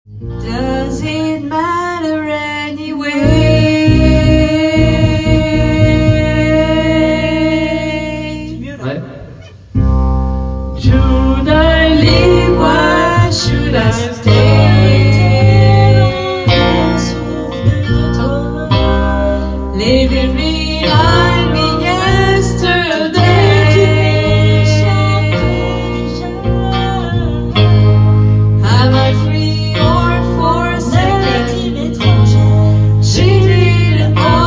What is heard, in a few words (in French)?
Travail Chant